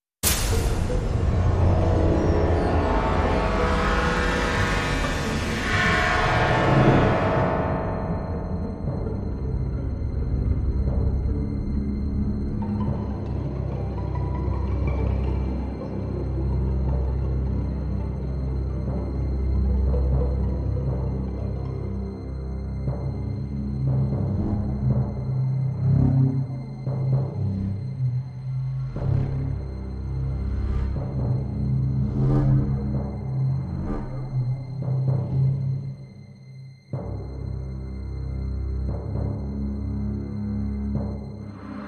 Thriller Noise

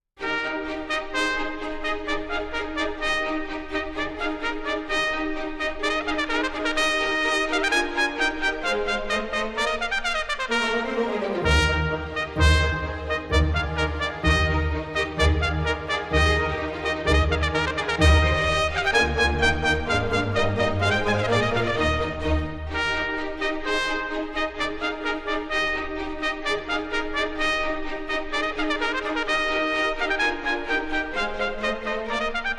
Trumpet Concerto Allegro

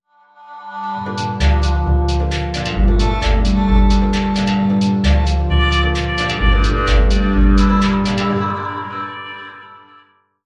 Hard Dance Short Flute No Marimba

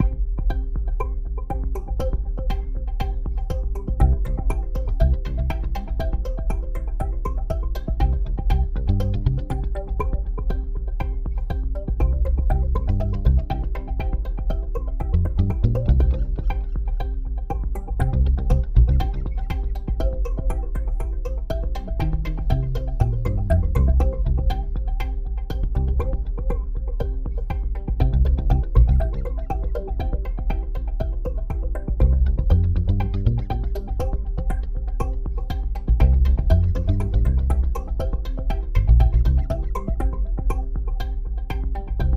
Bass

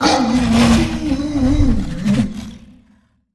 Lunar Werewolf Howl

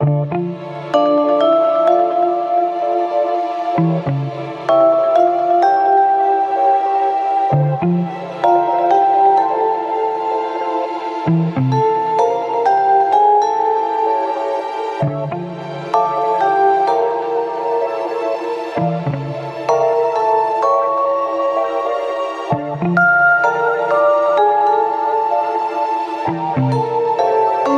Clandestine Cat, No Drums and Bass; 30sec B

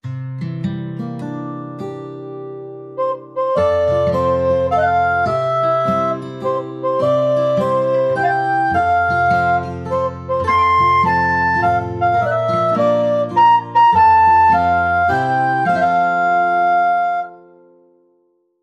Recorder Happy Birthday

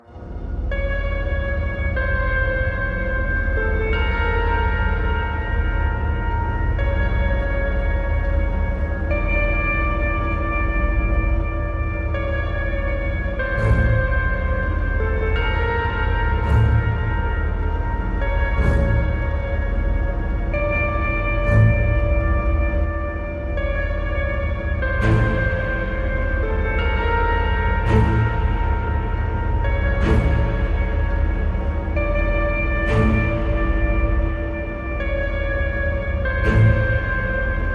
Orchestra Only

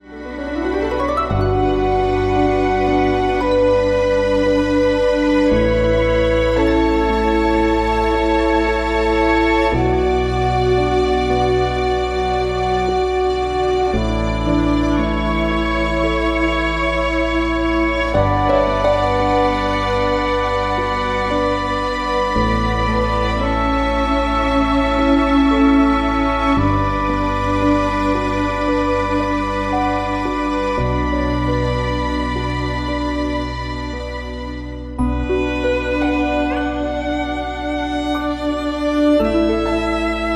orchestral legend

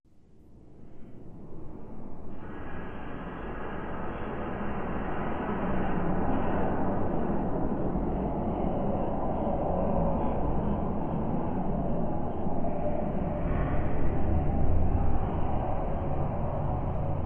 Dark Delirium Ambience

